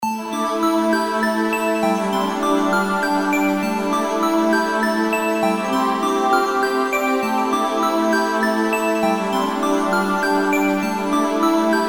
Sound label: synth bell